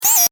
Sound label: Digital Signal Short Circuit Alert; Digital Clicks, Blips & Alerts; Digital Sound Effects